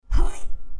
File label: Mod Rebuild Grunt; dialog